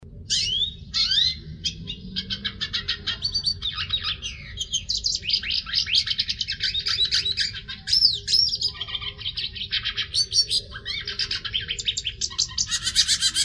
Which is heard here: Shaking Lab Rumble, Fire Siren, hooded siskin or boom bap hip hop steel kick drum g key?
hooded siskin